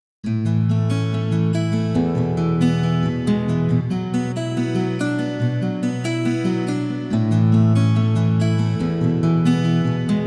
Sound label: Combination Steel string modern nylon, fingerpicked guitars; Marcos Ciscar